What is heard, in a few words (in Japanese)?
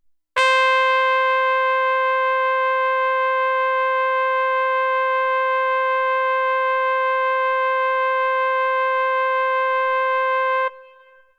toranpetto